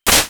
war fan; SNES; peach; SMRPG

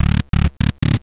Door; SNES; SMALLSTARS

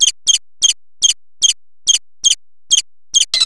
Birdish Noise; PC; MARIOTEACHESTYPING2

